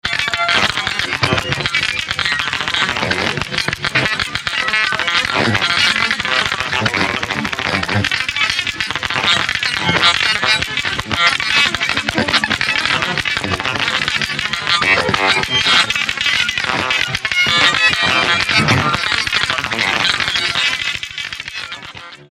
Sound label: Harmonics Glossolalia; Avantronix